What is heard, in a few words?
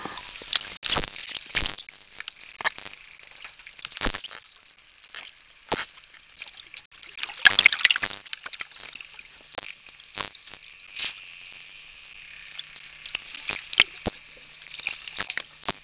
whistle, dolphin